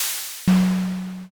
petrify

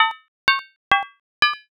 pinball

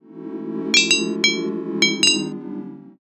tavern

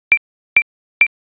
ecg

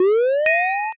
cast start

